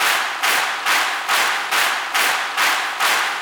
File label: Crowds; Vengeance Samples; VEC2 FX